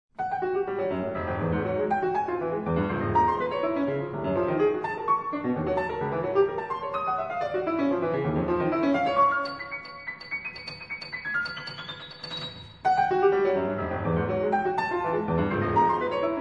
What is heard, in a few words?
toccata piano